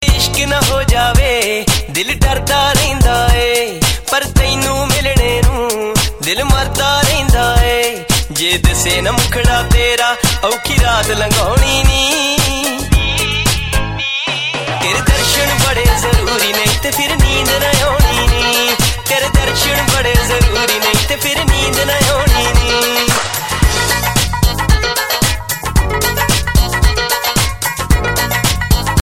punjabi ringtone

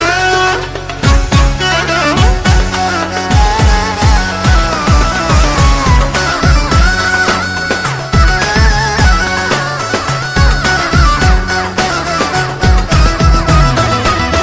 arabic beautiful ringtone

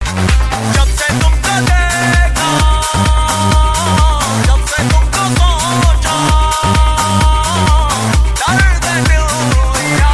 techno mix punjabi ringtone

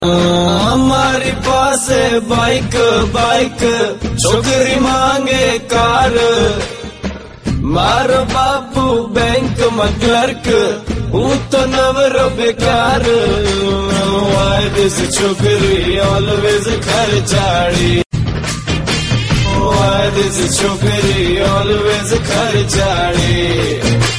gujarati funny

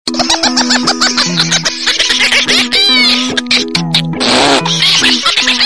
laugh; spongebob

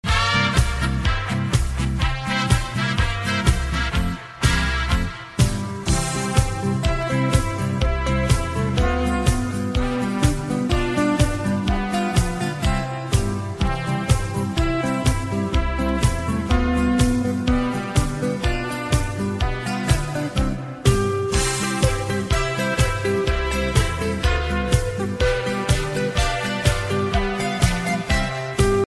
german polka

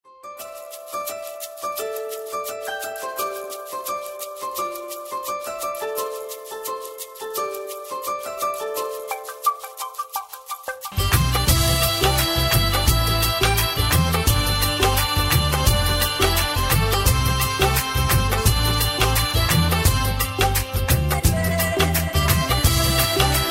instrumental ringtone

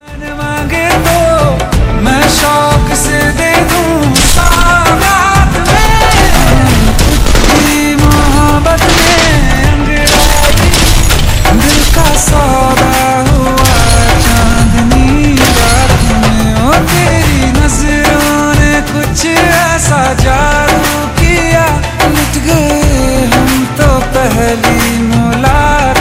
hindi

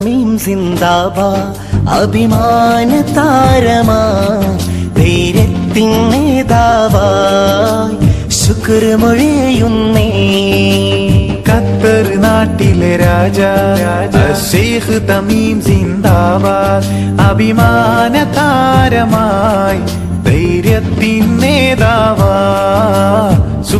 malayala song; qatar

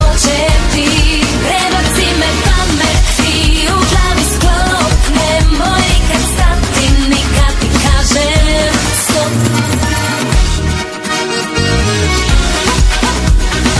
croatian music ringtone

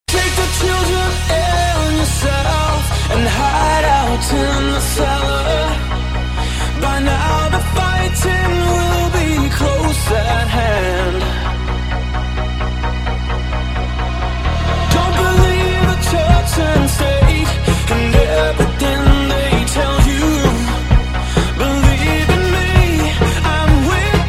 club mix, ringtone

